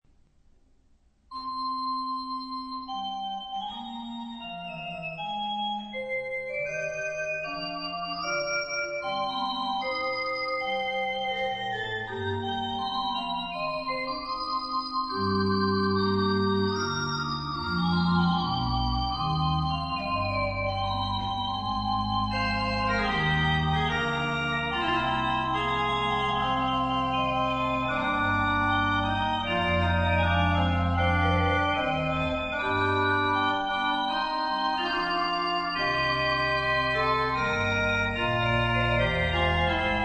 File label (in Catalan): orgue